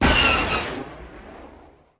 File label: laser, weapons